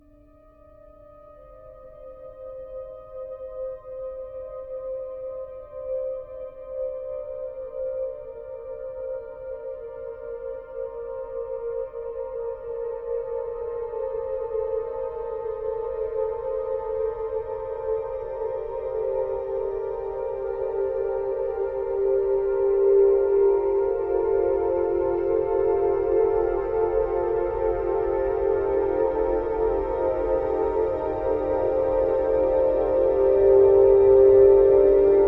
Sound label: Space 2 (Slow Waves); sleep